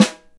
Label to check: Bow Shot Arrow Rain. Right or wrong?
Wrong